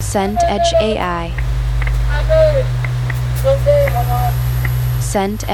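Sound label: ambience market outdoor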